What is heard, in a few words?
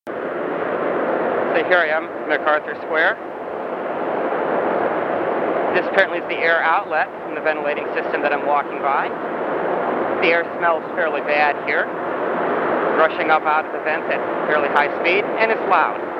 Noise, MacArthur Square